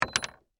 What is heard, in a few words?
Tool Set Down, Industry, Tool, Set, Down, On, Wood; Hand Tools (Sound Effects:Tools); BlastWave